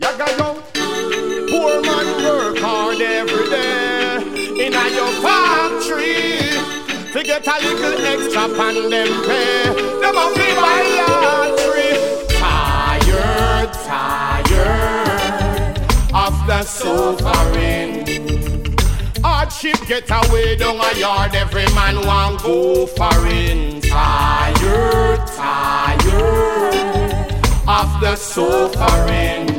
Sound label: reggae paradise riddim